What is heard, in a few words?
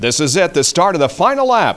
Last lap; NASCAR Road Racing